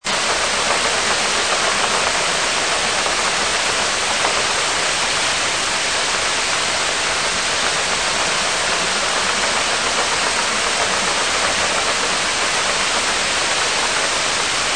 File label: merchant vessel